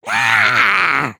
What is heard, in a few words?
Air Fall; creatures organic; Lego; Strombie